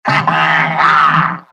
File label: high five success; Pyro; MvM